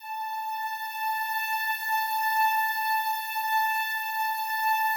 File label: slow strings A4, Yamaha VP-1; 90 s Sample CDs; Music Store